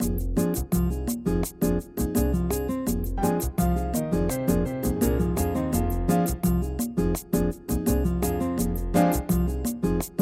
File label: Bossa